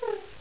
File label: idle, headcrab; hl2